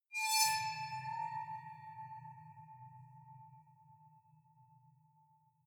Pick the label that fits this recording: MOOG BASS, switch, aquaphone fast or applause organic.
aquaphone fast